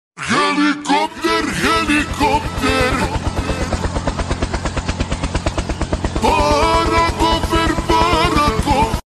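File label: helicopter slowed and reverb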